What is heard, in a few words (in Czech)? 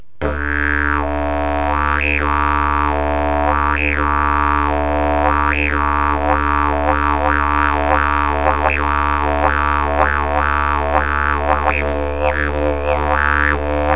olse, didgeridoo; zimni